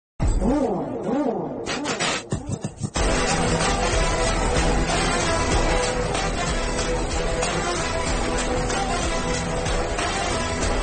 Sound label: Theme Music; Sketch Movie